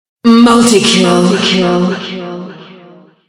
music stinger; killstreak music

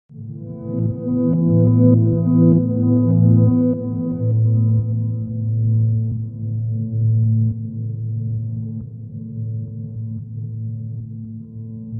music match intro connecting 60bpm; Omori sound replacements

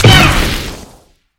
wall jump; wall jump trick

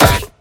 skull hit; necro; hauntingdead

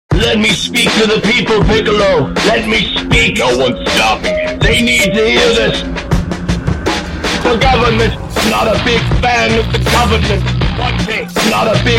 music match intro 160bpm

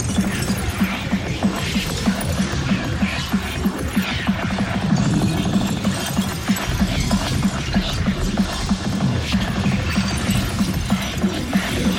blasted; prowler sfx

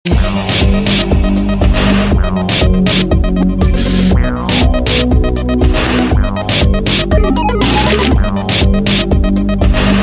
music idol timer lp opponent 160bpm; Omori sound replacements

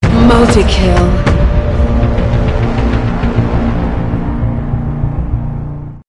music stinger; killstreak music; UT2K4

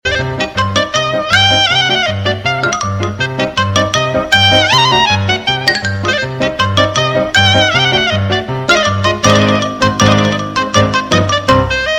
music idol timer lp opponent 160bpm; Hebrew urn pack

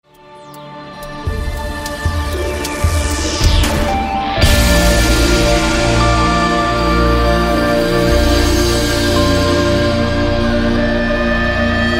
music brawl round start 95bpm; Risk of Rain music pack; in-game music

